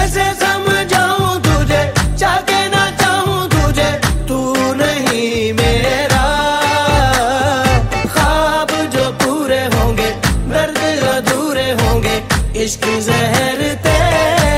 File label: pakistani drama ringtone; ibnehawwa